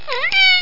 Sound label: MEOW